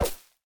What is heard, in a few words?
dye; minecraft; item